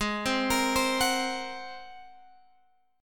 Ab 9th Ab down Guitar Open G

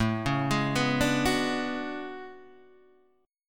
A Major 13th, Guitar DADGAD; chord

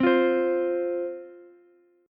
F 5th F, down, Ukulele; F5 strummed

